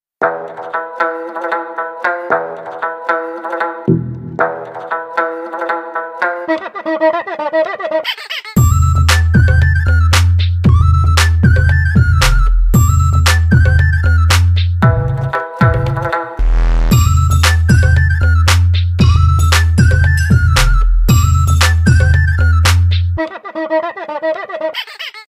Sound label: BGM korean ringtone